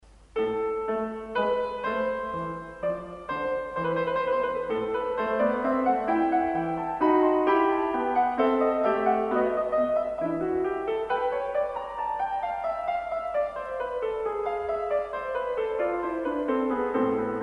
piano piece, bach sinfonia; in a minor

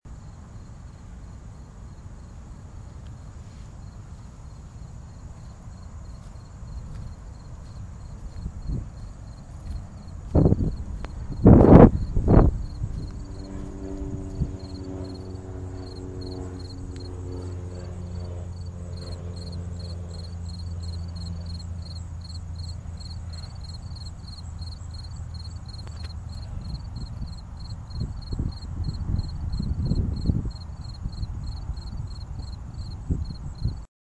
morning crickets; greenpoint

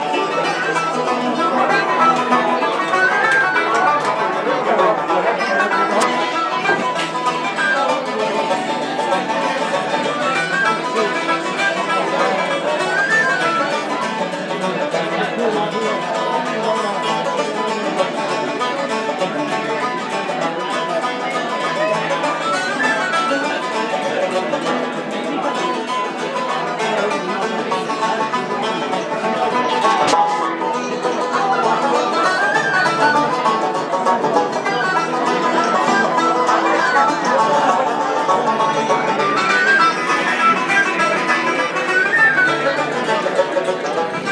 Irish music in the pub; after rain

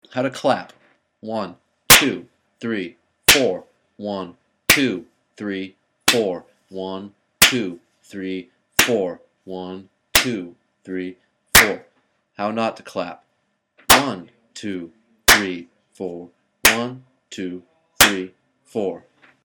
how to clap

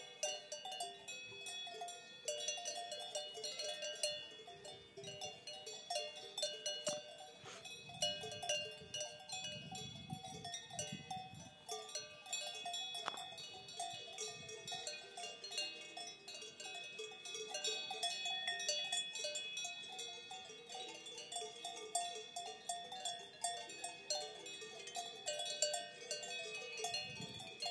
swiss cow bells

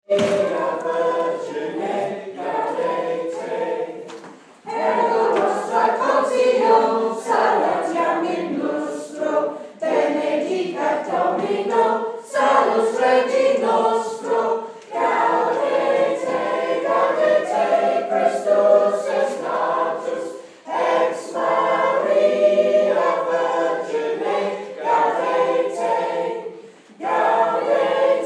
class 4-5 rock a Latin carol; it's christmas steiner school style

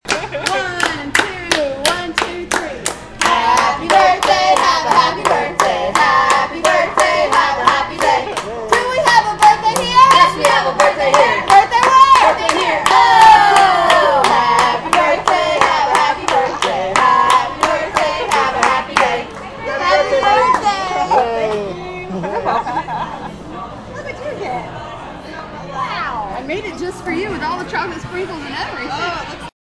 happy birthday happy happy birthday

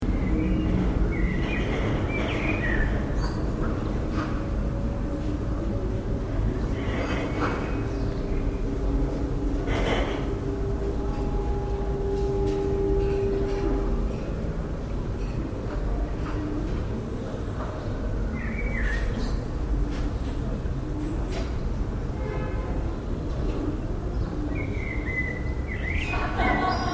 fridayevening soundscape, Bremen; near to breminale

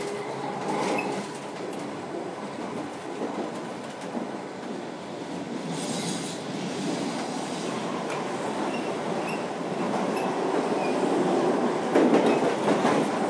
tube approaching South Kensington; uksm

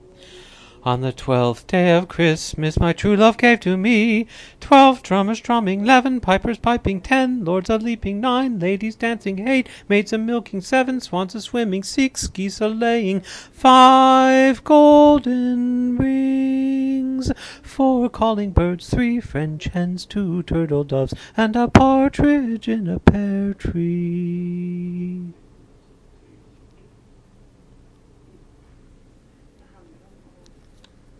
singing a test

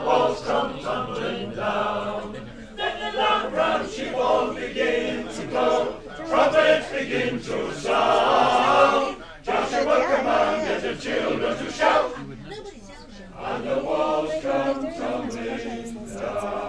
pub in York

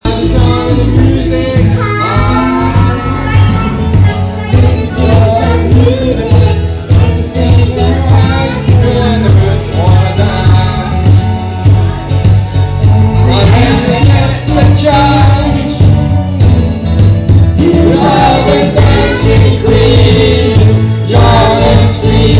Sunday karaoke

